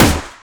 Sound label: Shot Gun; FREE SFX PACK VOL 2